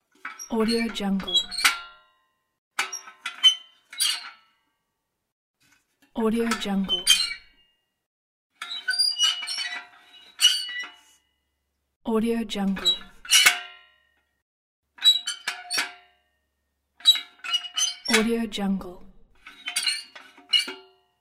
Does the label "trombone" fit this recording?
No